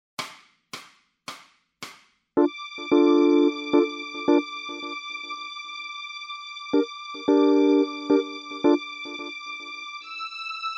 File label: bass blues funk, backing tracks